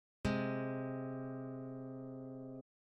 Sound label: intervals harmonic minor sixth, guitar